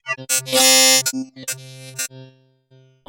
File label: synth sawtooth; pooper; soundmorph